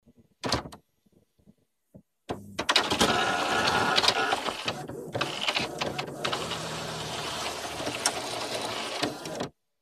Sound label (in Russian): printer